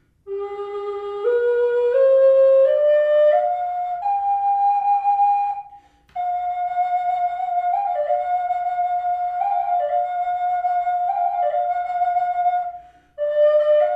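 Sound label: reverb, flute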